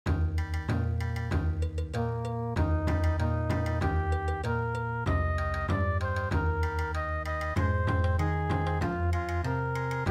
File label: Bon Odori; traditional